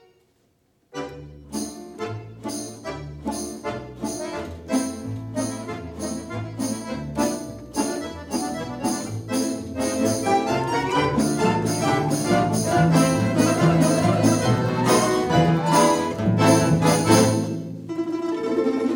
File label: Gypsy Guitar, balalaika